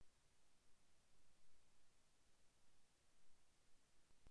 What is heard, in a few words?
stab